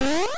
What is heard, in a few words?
high jump; GBA; MLSS